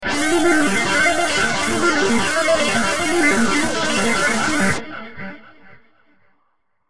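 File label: Musical Element, Drum Snare March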